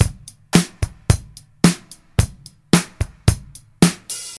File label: 110 bpm drum loop c sharp key, kick tuned to the C# note